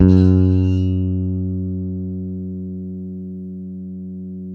bass sustain F#2; Sampleheads; Will Lee Bass Library

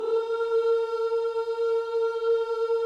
OOH, Gospel; Spectrasonics Vocal Planet

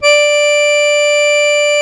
AKK ORGAN D3, AKKORDEON, Alpine Volksmusik; Best Service

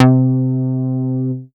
MOOG BASS C4; Best Service Dream Experience

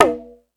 Hip Hop, Drum Machines E-MU Pro-Cussion

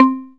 DX MARIMBA

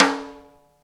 Snare, Drum & Bass; 90 s Sample CDs; Total Drum&Bass

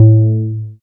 BAS FM DX-7

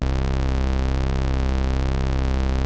SH101 BASS; Rubber Chicken Software Sample Bank; ROLAND SH-101

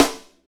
snare, hip-hop rap, Roland; Northstar; Drumscapes Roland

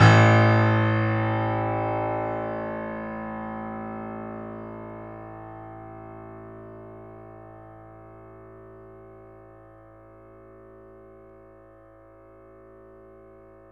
3D Boesendorfer, Pianos, A; E-MU Producer Series Vol. 5; 3-D Audio Collection

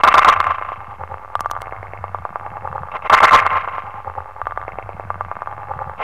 Space Ratchet Beat, Electronic Beats; Sonic Foundry (Sony Creative Software); Myths of Technology

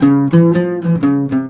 LUTH, Arabian Traditions; USB Soundscan vol.43; AKAI

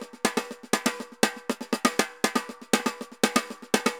tambor, merengue; Best Service ProSamples vol.14; AKAI

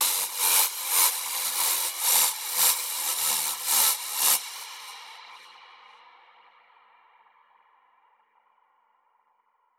alarm siren, Verb Proc, 110bpm; musicradar

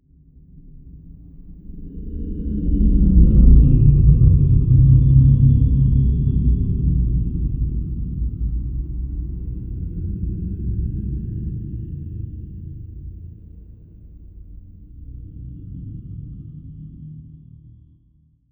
M SHIP, Anime Sound FX, FLY OBJECTS

